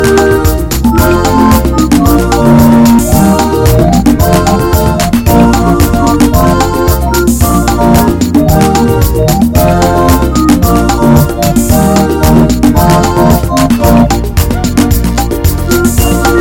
Fast and Hot

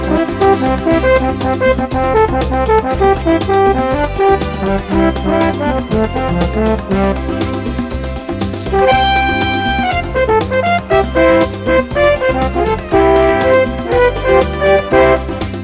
Lets Do the Latin Jazz

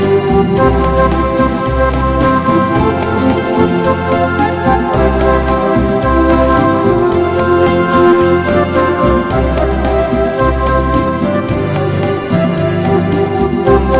Beautiful Island Song

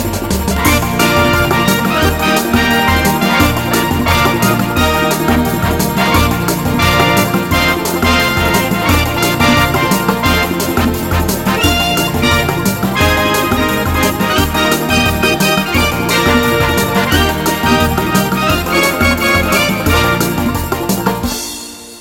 Smooth and Fast Song

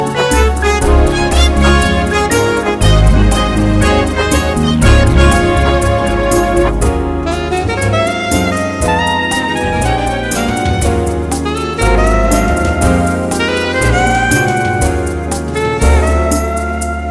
Very Pretty Ballad

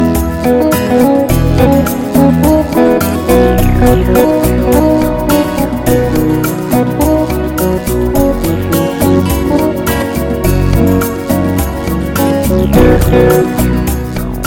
Smooth Unplugged

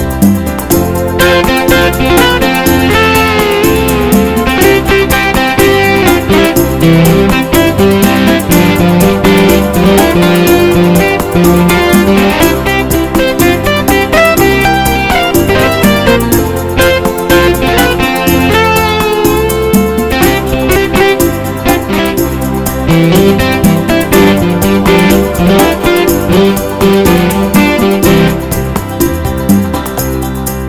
Sweet and Soft Ballad

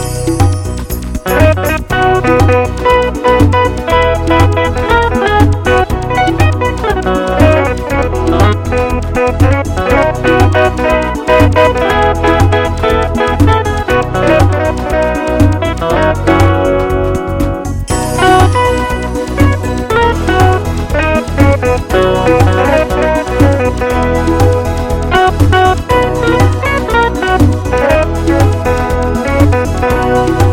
A Last Pop

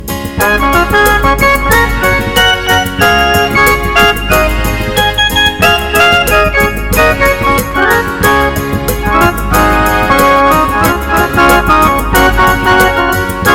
Beautiful Salsa Music

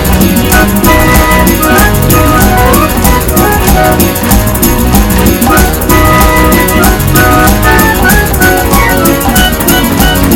Great Band Jump

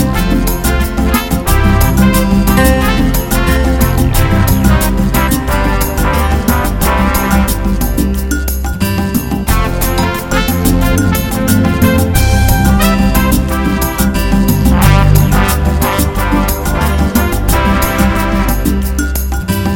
Great Modern Latin

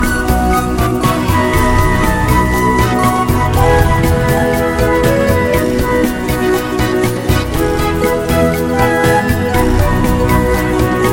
Hot Smooth Rumba

